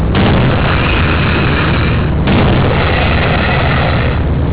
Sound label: hammer